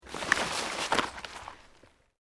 Underbrush Dead Move, Rustling; Material components for constructing more complex sounds; Objects

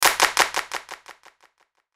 Hit Clap Delay; Production Element Imaging Element Accent Transition; Claps; Sound Effects:Multimedia; Blastwave

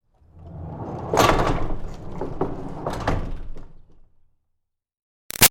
Door Barn Rolling Metal Clang Big Rattle; Barn Doors; Big Room Sound

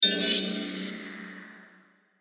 Metallic Crystal Reverb Scrape; Metallic Sound; Metallic Sound Sound Effects; Production Element Imaging Element Accent Transition

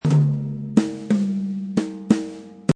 Jazz toms drum loop at 180 bpm.; Drum Loops

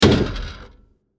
Plastic Burst Hit; Bursts; Impacts; Sound Effects:Impacts; CraftedSignals

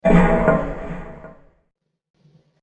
Scary Scene Wind And Metal, horror sound effect; Scary Scene Fx - Wind And Metal; Scary Soundscape Sound Effects

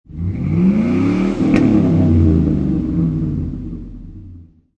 Truck 5.3 Liter Rev Exhaust Perspective; Auto, truck, motor. 5.3 Liter Truck / Lorry. Rev engine one time, exhaust perspective.; Car Sound Effects; LynneMusic

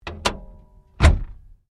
Bonnett Close, car; Hyundai Accent 2008; Hyundai hire car; Vehicles

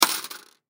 Coin Quarter Single Drop Drawer; Money; Foley; Blastwave

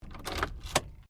CAR SUBARU LIBERTY INTERIOR SHUTDOWN

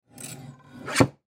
Paper Cutter Down; Office; Blastwave

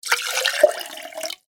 Water Poured Into Drinking Glass; Water Poured From Jug Into Drinking Glass; Pouring (Sound Effects:Objects); McKinney Sound

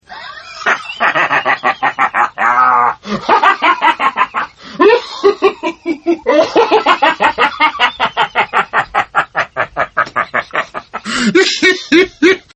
Laugh Madman; Laugh Female; Laughter; Sound Effects:Voice; Partners In Rhyme

